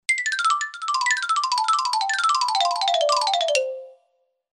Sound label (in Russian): skatyvaetsja smeshno ksilofon